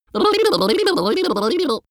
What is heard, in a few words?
lip smacking child long; vocals; comedy-cartoon; soundpool